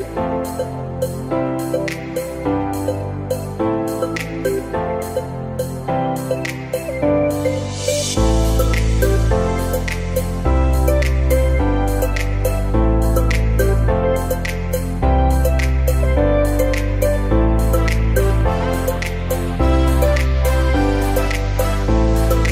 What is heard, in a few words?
Vivo Nex ringtone